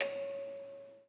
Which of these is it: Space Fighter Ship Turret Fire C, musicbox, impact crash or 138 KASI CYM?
musicbox